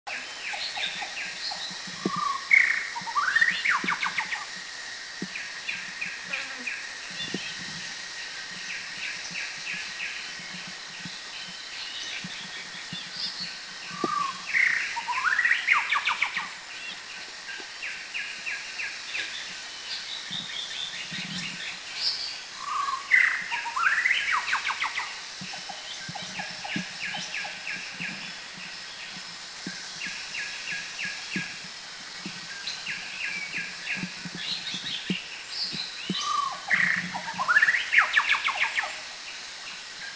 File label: White-rumped Shama